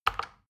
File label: plastic button tiny click regular fast